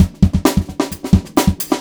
ACOUSTIC, Big Beat Drumloops

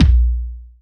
house kick; Greytsounds; Scott Peers Sound Engineering

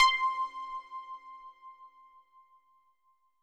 C5, synth waves; Music Store Sound Service; Einstein

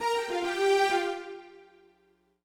Smooth Groove Strings, 70s Analog Funk

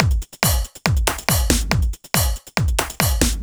Kick Loop, 140bpm; Trance; Kick Loops; Zero-G Trance Inducer; Drum Loops 140bpm; 90 s Sample CDs

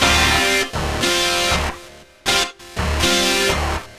Mean Machine, Keyboards

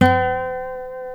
C#4 nylon, Guitars

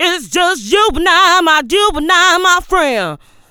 VOX, Funky Vocals; USB Soundscan vol.18

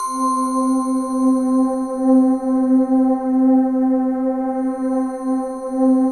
World of Synthesizers, SYNBELL

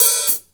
HH, Drum Dry; East Collexion; Drum 1

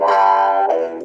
WOW, Funk Guitar; 90 s Sample CDs; Zero G

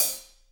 HH, Roland, Real Kit; 90 s Sample CDs; Sound & Vision - Gigapack I CD 1; Real-Kit s 4

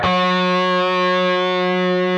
PRS G, Guitars, LEAD

